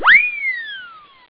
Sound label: flame max; Dynamite